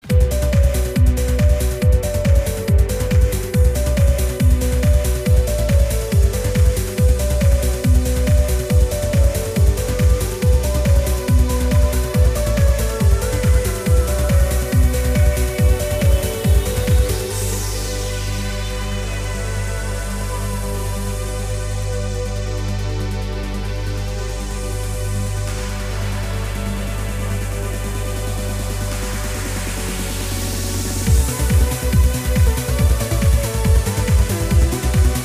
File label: One Million Strings